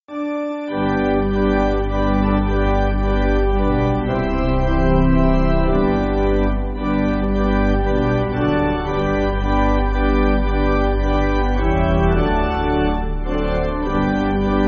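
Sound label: Pipe Organ, Backing Files for Churches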